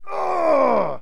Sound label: Pain, soldier; hellenes